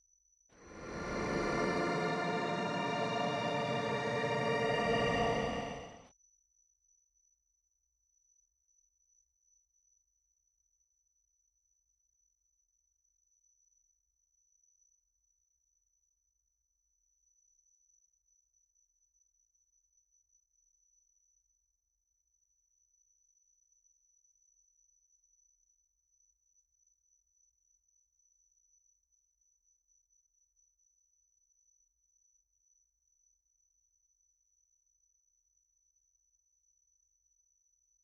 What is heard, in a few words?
brass